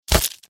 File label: bullet impact flesh